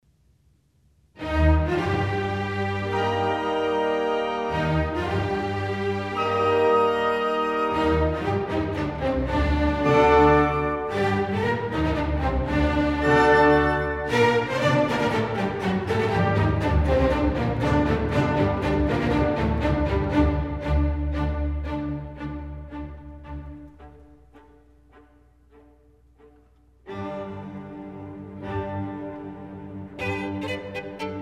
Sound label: Scottish Fantasy for violin & orc; Kyung-Wha Chung; Bruch